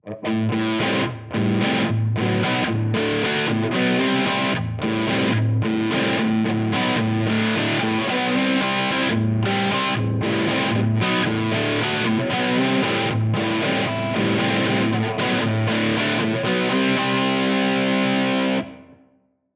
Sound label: Rat